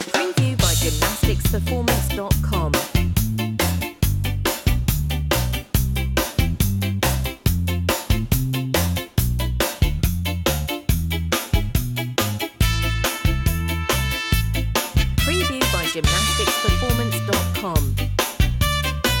raggae rock

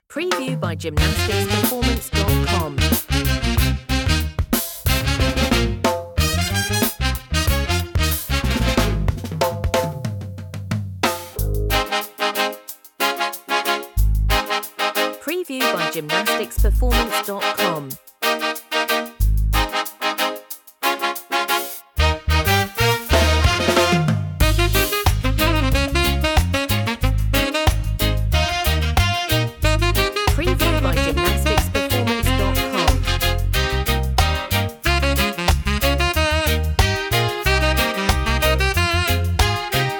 raggae

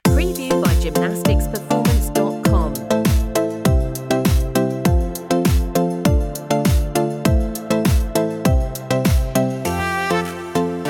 pop dance

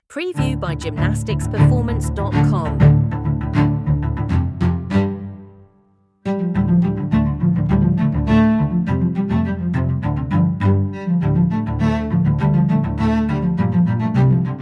double bass dance

